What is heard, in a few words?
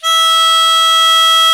HARMO E4, ORGAN; AKAI S-Series CD-ROM Sound Library VOL-8